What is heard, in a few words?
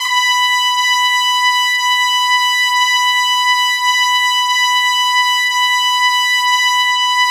Orchestral Brass; Best Service ProSamples vol.20; AKAI